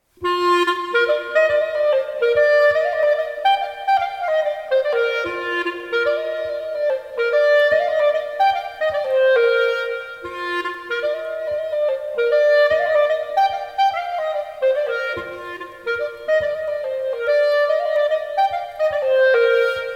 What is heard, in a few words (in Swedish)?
polska; svanskalatar